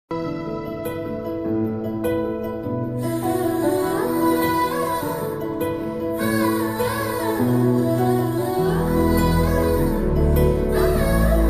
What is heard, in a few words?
romantic music ringtone; chandramukhi 2 movie; new south movie ringtone 2023